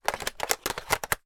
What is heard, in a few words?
household telephone receiver place down clumsily fumbling plastic modern